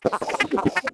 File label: droid vox; droids robots; coruscant